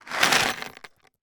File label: industrial plastic box of small screws tip out on carpeted floor